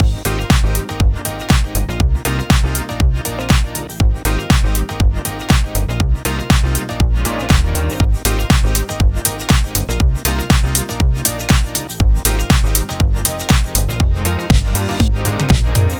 pan flow

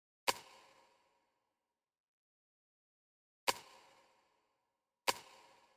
snare

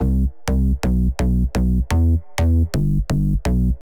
bass after

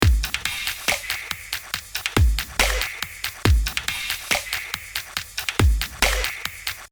drum

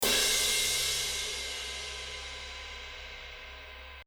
crash

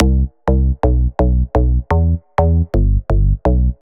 bass before

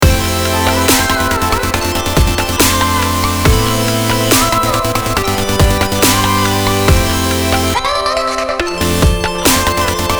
lead mix

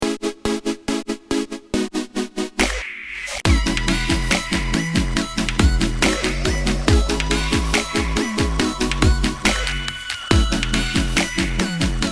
serum

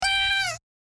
vocal

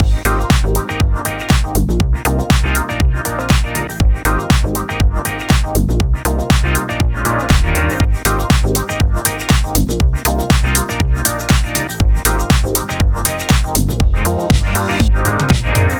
filter step on

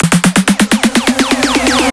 buildup before

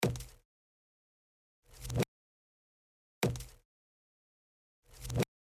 percussion

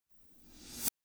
rev hihat

